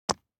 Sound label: DICE on Neoprene, Drop, Standard, 4 Four Dice; Samples; ArticulatedSounds; DICE Sfx Party (Extended)